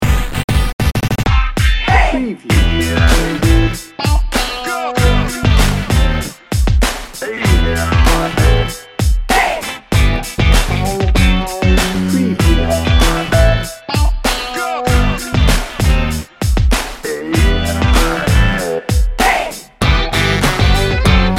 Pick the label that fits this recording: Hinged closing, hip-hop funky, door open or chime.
hip-hop funky